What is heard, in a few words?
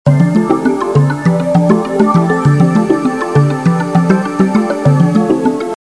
boiling